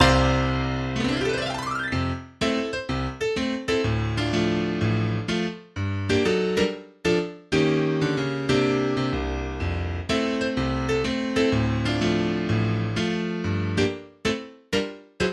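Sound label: Party Music, MIDI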